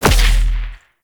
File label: Edge Kick; Warframe; Pacifist